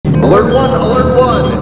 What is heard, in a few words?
alert